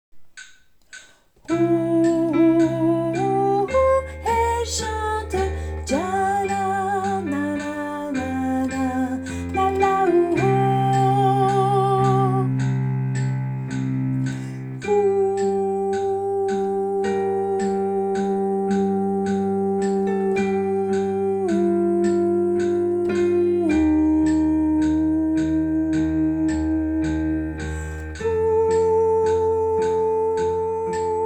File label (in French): basse